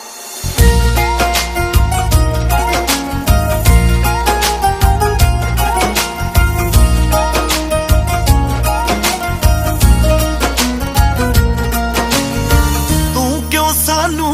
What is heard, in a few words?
punjabi ringtone